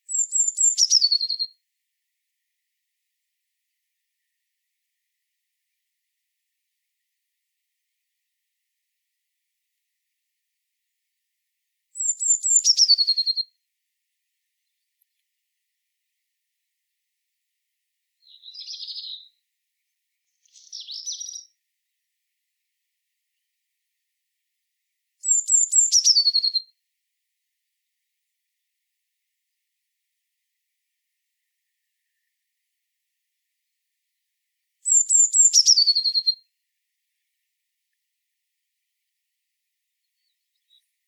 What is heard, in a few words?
Cyanistes caeruleus; SETE